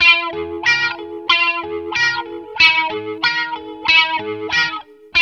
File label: WAH, Distortion Gtr; E-MU Producer Series Vol. 8 – Platinum Phatt